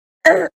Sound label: hurt sound male; terraria